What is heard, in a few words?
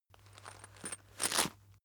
chain mail movement